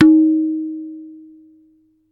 tabla tun

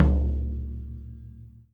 Tom Floor Softest; hydrogen drum samples

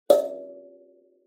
MBira pluck D4, Kalimba, Tanzania; Plucked Idiophones

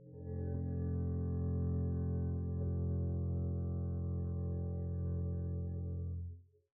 arco f1 pp down, double bass; dsmolken

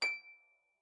Harp D7 f, Concert Harp; Chordophones; Composite Chordophones

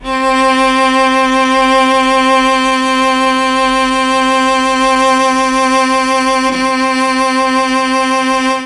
C3 cello, mellotron; CHMBLN CELLO

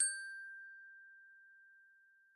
glockenspiel loud G5; Idiophones; Struck Idiophones

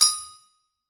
Anvil Hit, Struck Idiophones

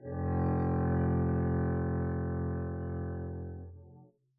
arco f1 p down, double bass; dsmolken

